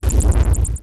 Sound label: Animation Electronic